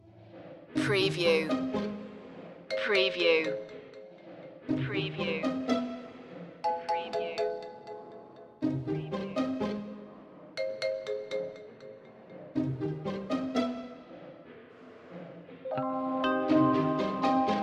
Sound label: undercover mischief no bass drums